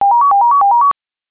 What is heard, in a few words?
snom ringer